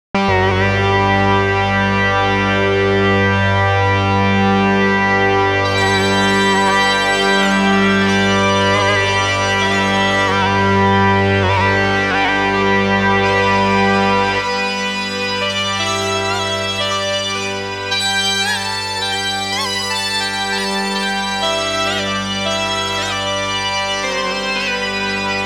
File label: sound of the bagpipes